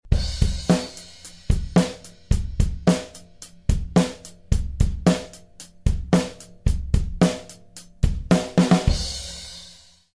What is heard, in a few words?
beat